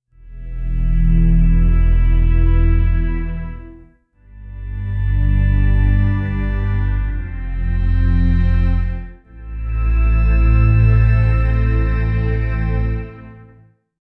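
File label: sad documentary background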